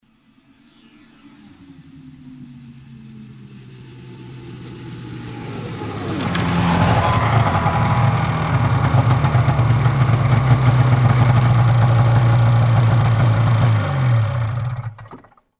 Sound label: Snowmobile is approaching, waiting, muted